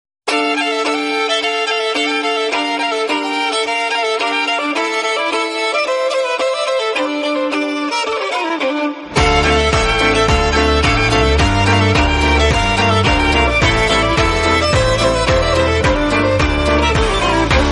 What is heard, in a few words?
fairy tale romantic musical tone ringtone